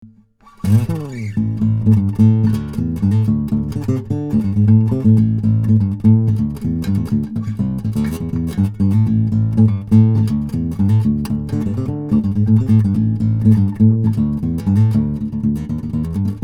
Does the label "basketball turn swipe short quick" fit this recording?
No